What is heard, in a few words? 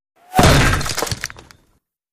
Bullet Impacts Hitting Masonry With Whir In And Debris